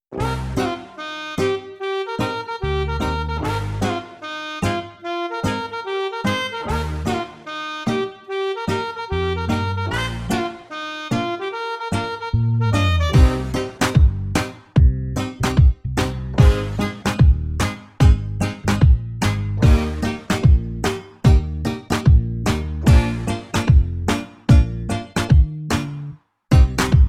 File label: Siren Beat; Tik Tok